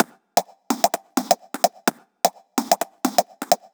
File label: Loop, 128 BPM; VES2 Loops 128 BPM